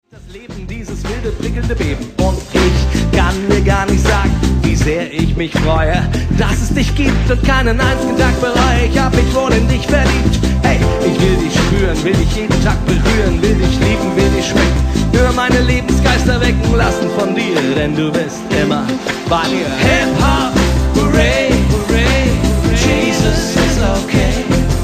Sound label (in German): hiphop hurray